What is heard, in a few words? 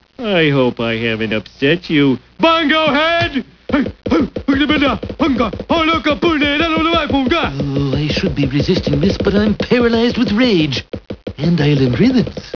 bongo